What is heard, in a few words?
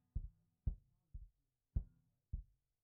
Kick